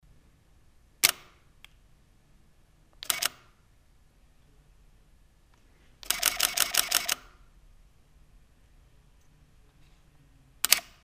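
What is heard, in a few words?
live view mode, canon eos 40d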